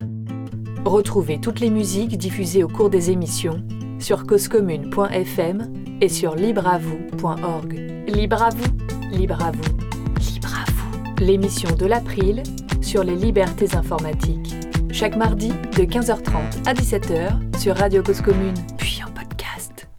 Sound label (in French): jingle pause musicale